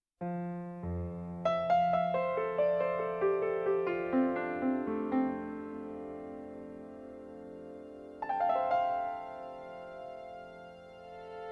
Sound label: music babies